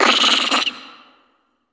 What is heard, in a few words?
Monkey